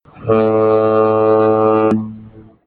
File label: Long Blast